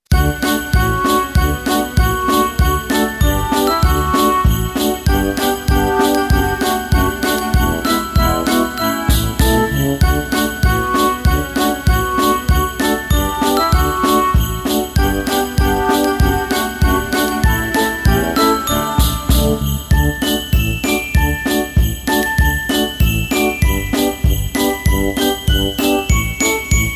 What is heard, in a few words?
JINGLE BELLS RINGTONE